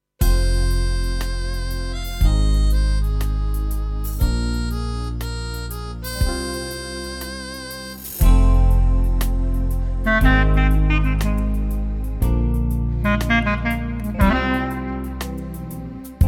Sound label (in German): Klarinette